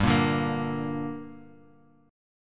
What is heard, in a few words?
G Minor G, Guitar, Half Step Down